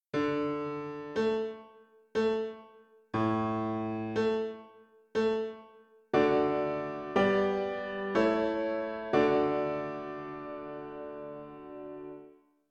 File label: Piano